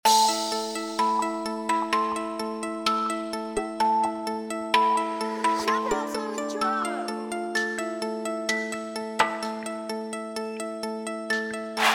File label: Instrumental